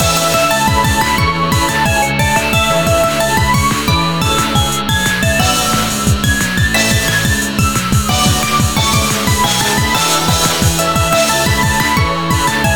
Battle; chicks chan factory barrett rush; android

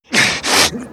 Fatigue Hard

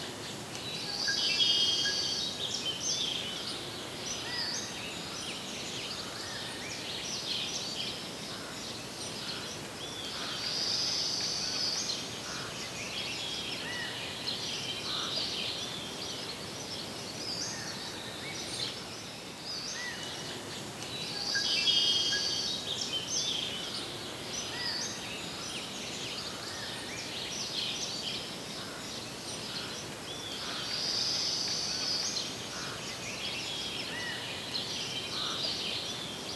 (Ambience) Farm; Jump Rope Challenge

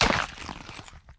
squish

